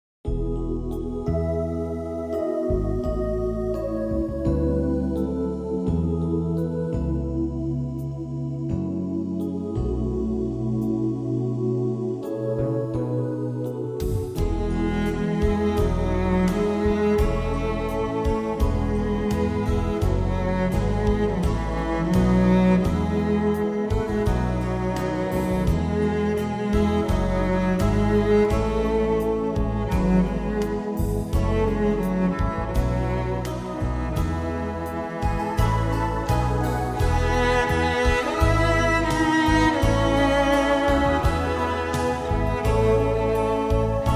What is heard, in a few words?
Ambience Cello